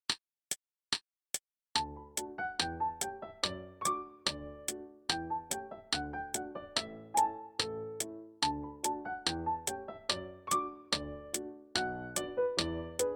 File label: gavotte no repeats full tempo with clicks